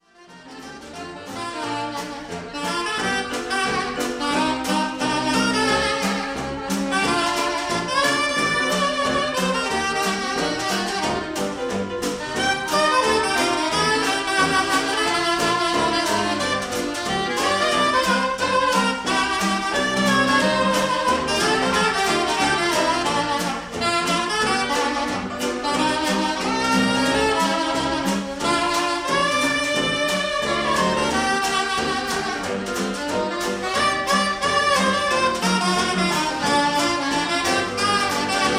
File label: Washboard Band